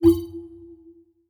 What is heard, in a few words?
Checkpoint